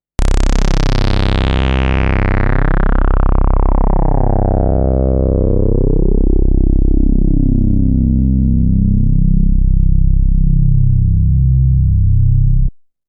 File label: VCF in mid reso, synthesizer